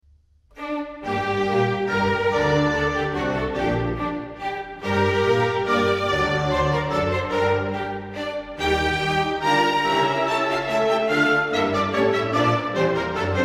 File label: Menuetto Trio; Mozart 40 and 41; No 40 in G Minor III